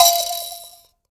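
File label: VIB KALIMBA F 5; Heart of Africa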